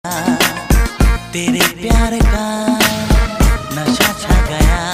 Old Ringtone

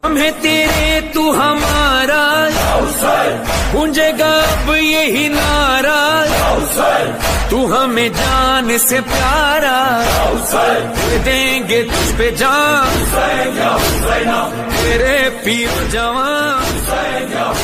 Noha Ringtone

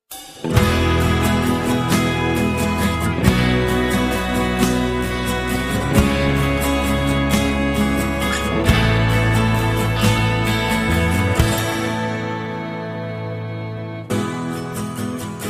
Instrumental Ringtone

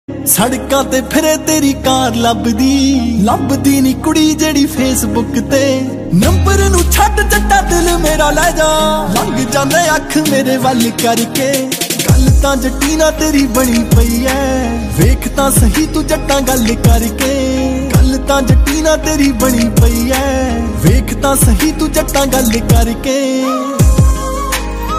Punjabi Song Ringtone

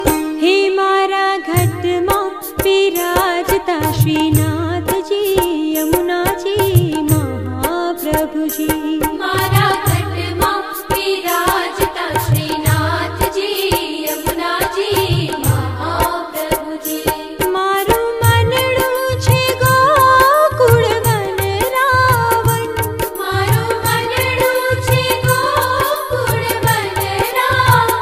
Female Ringtone

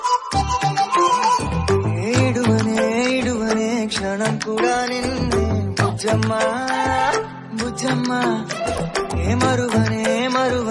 Telugu Song Ringtone

